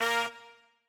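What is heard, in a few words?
trumpet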